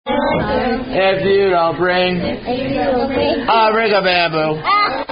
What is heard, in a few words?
Singing; Rigabamboo; Princess Pat (Fragment)